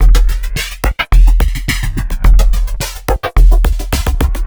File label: Dance Beat